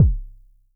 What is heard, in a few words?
K Quasi Sub, Vermona Mono15 Drums Kicks